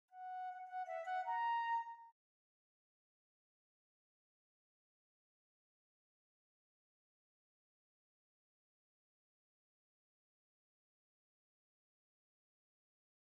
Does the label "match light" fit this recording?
No